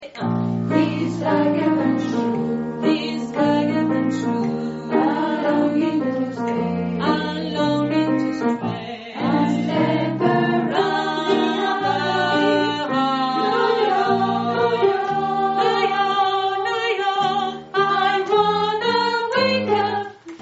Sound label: alto